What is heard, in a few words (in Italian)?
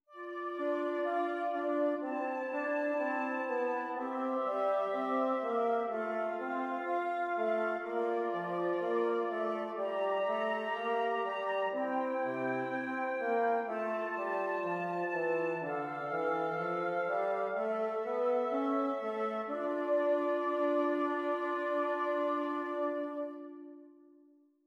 Esercizi di contrappunto a tre voci; Zweite Gattung; Ronge pagina 62; prima versione